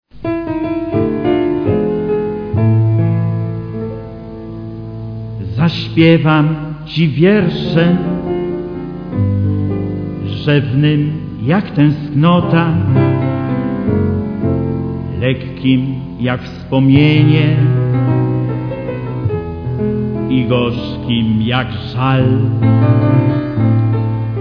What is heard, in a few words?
Piano